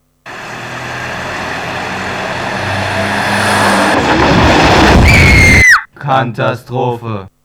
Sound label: JINGLE